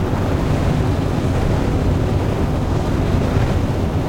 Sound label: fire large loop; rise of maya